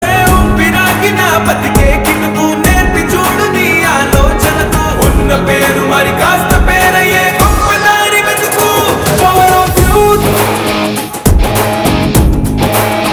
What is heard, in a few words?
Ringtone Telugu; Yuvarathnaa